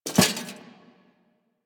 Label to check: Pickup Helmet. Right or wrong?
Right